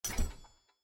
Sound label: ui nav forward; beasthunter; everdawn